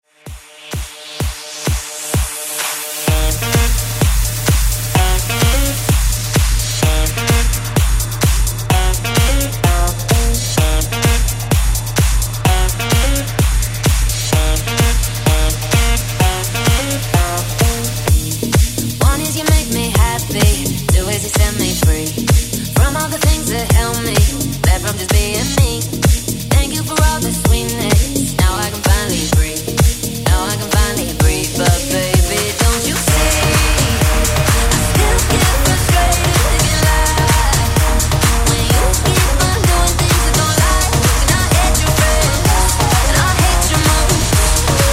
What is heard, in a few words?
EDM